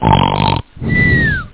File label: snore